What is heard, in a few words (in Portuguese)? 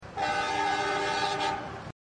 Buzina; Comboios